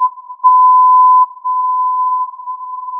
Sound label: filtered input